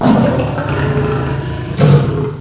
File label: lion